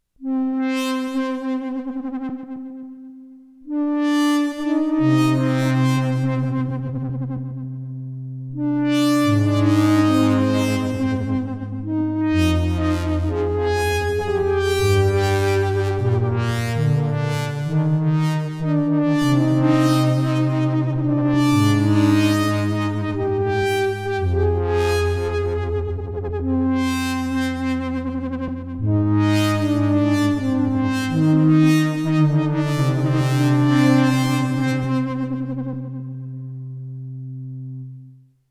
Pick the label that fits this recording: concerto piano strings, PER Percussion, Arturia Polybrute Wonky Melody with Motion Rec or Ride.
Arturia Polybrute Wonky Melody with Motion Rec